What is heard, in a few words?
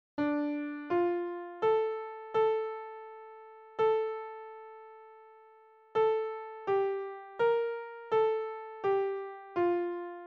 O Come Emmanuel one octave, handbell